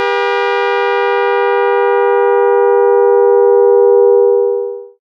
nuclear reactor siren clear; hv nuclear reactor; technic; dreambuilder modpack

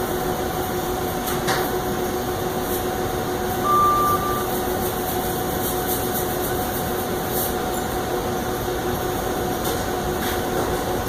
background noise

